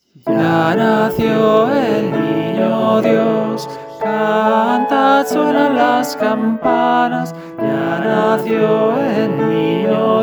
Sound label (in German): Sopran; Il est ne le divin enfant